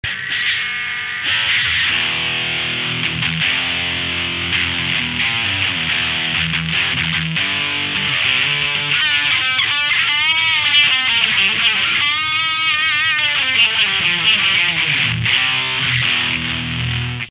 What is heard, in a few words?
jcm800 bluesbreaker